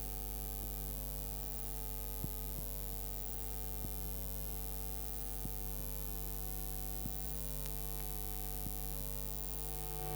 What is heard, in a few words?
Tape Noise; noise hiss crackle